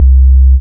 DD BAS, Disco House; Best Service Dance Mega Disco House

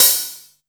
DRM SN; USB Soundscan vol.02; Underground Hip Hop; AKAI

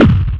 Dunk, Drums, Industrial

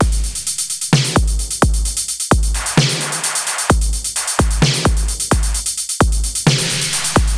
Beat Eliminator; Zero-G; Future Wave; Beats

